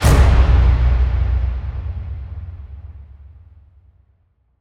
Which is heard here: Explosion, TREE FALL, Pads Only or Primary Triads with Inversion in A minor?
Explosion